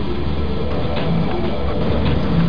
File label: Factory; PC Gamer IT CD 41; machines demo